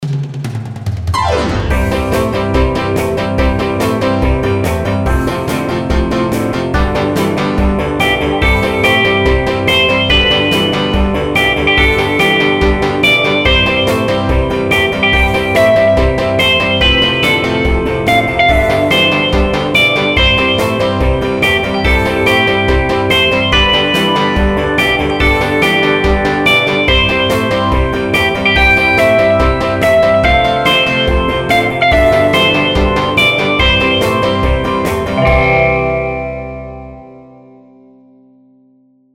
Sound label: Happy Birthday to You Rock n Roll Version